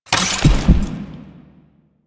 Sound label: Sniper Aim In; DE; Warframe; Warframes; Frumentarius; ExaltedSniper